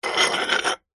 foley wall tile small short scrape movement on concrete; zapsplat